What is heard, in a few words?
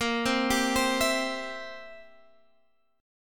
C Major, Bb, chord